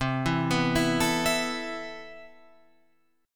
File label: C Major 13th C down Guitar Open F; CM13 arpeggiated; JGuitar